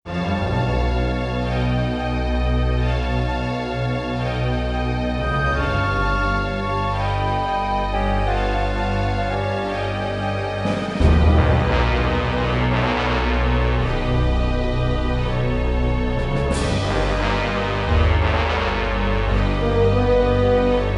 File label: Suspense